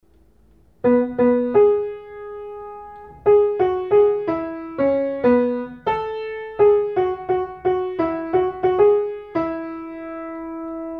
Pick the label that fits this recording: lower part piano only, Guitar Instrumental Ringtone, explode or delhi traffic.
lower part piano only